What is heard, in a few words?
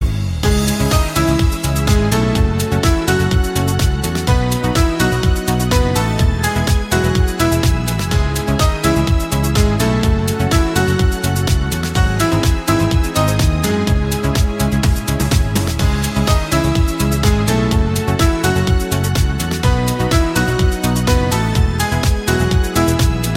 Lyrical Music